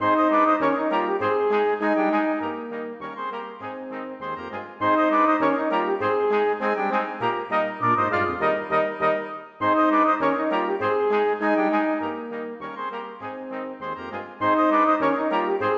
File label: Polka; Nilsson; På vift